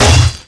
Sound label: hit world; weapons; 3rd degree; tf2